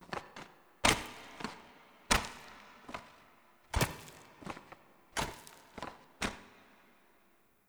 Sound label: Teshin Foley Walk; Warframe; The War Within; Mountain Pass; Cinematics